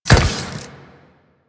Moa Step; Warframe; Quests; The New War; Veso

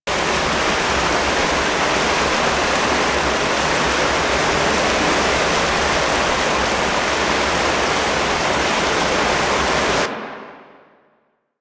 Waterfall Huge Loop; Ambience; Props; Loops; Eidolon; Warframe; Eidolon Plains

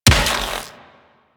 Rifle Shot; Warframe; Weapons; Infested; Inf Quanta

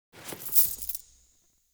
Coin Pickup; Objects; Pickups

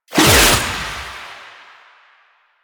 Throw; Kuva Lich Finisher Parazon; Warframe; Finishers

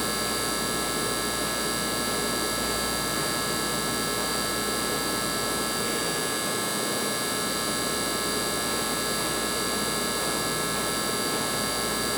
Subway Interior Static Fluorescent Light Loop; Warframe; Ambience; Nineteen Ninety Nine

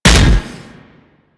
Impact Metal, Weapons; Warframe; Furax

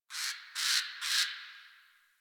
Tree Critters; Warframe; Duviri

